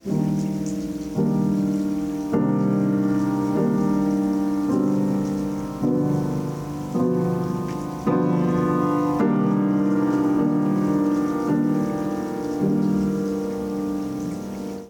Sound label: Piano Rain